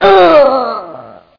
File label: stomach; TomCat